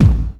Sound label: BD, Dance Mega Drums, BD HOU; Best Service